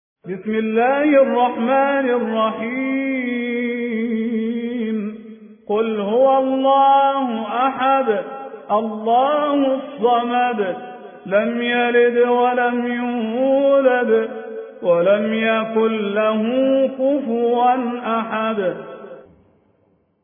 ترتیل; سوره اخلاص

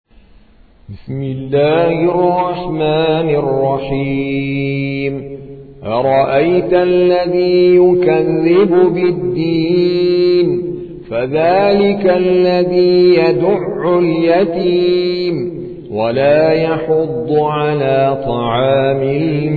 ترتیل قاری هندی; سوره ماعون

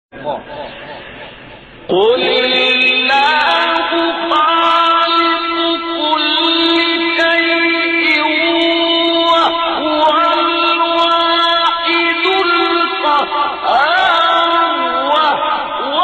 تلاوت آیه سوره رعد; آیه 16 17

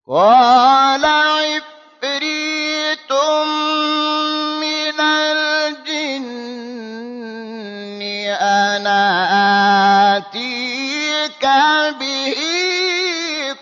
تلاوت مجلسی سوره نمل; سوره نمل آیه 39; شحات محمد انور

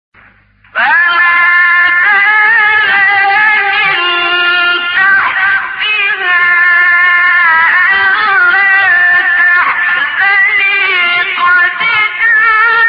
تلاوت زیبا و ماندگار آیات سوره; 24 36; مریم; عبدالباسط

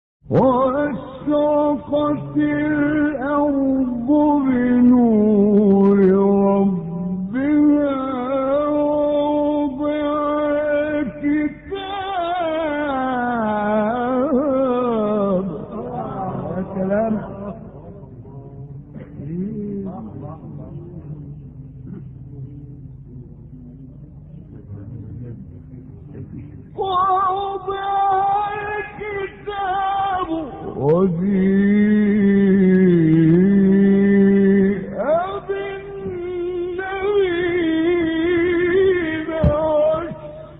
تلاوت آیه سوره; 69; زمر; استاد محمد احمد عمران

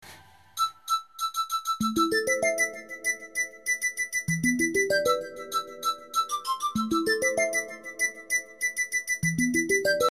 foloot ringtone

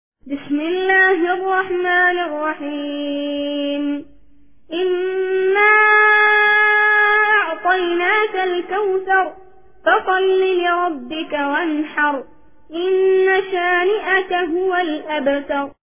قاری نوجوان یمنی ترتیل; سوره کوثر

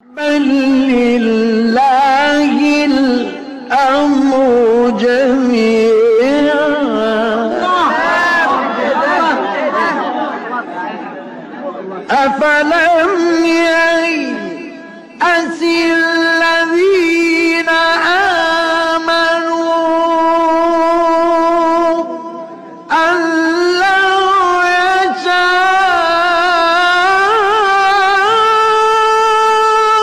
تلاوت زیبای آیه سوره رعد; آیه 31; استاد مصطفی اسماعیل

